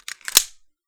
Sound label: mag in, weapons; hk45